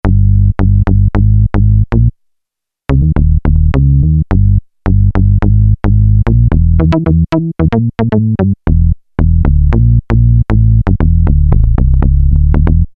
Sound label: SH101 Mute Bass, Roland JD-990; Vintage Board